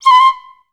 C5, Orchestra; Best Service; Peter Siedlaczek's Advanced

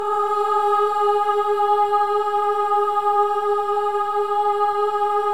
BOYS AHS, Symphony of Voices

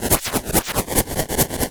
Crushed Perc (140BPM); musicradar

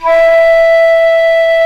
flute, C flute

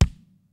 kicks snare, kid; Kid Nepro; Elite; KICKS SNARES

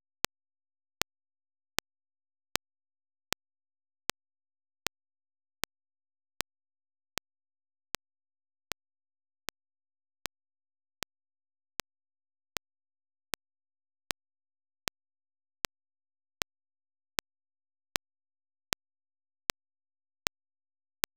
Noise, LoFi Noise; DIS Noise TY3 - SP; Roland BK-5

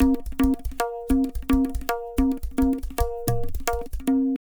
Tabla, 110 BPM; Zero-G; Pure Tabla

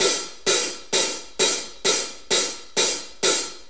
4-4 HiHat Loop; Drum Tools

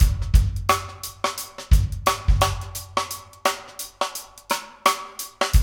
Funky Drums; AMG; Now CD-ROM (Roland); Neil Conti

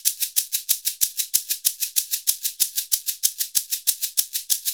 SHK, Shaker; Univers Sons; Basicussions

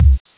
4 beat Bassdrum + hats; Urban Shakedown

